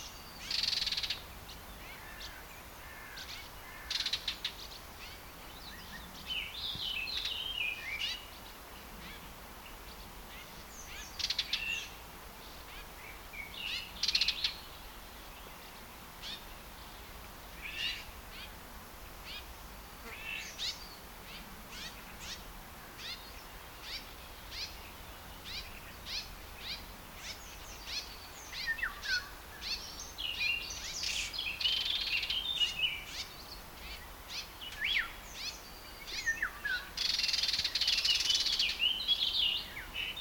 tövisszúró gébics hangja, Lanius collurio

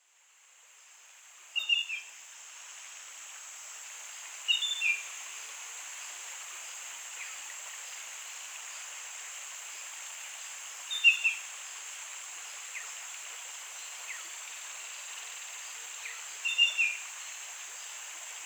mocsari canko hangja

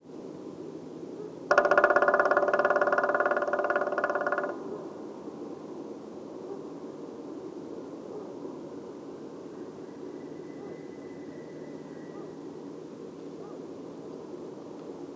fekete harkaly hangja

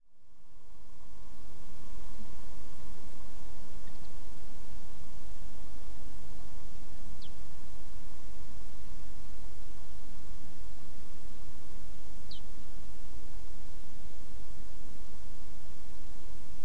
sarkantyus sarmany hangja

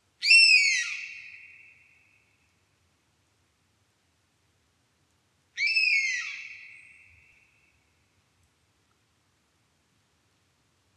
darázsölyv hangja

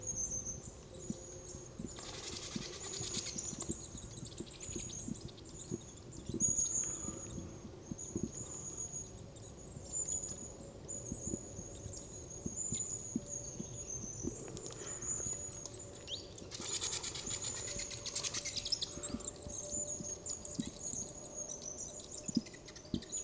karvaly hangja

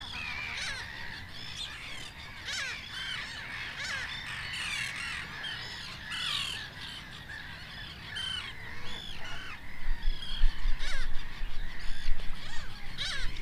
szerecsensiraly hangja

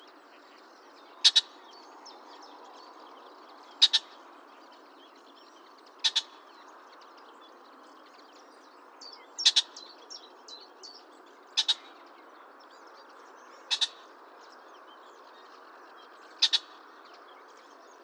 favan hangja

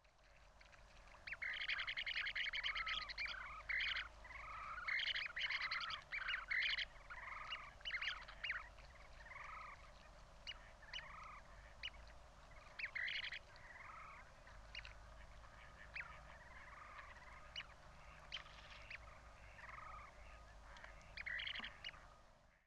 szeki lile hangja szeki lile Charadrius alexandrinus

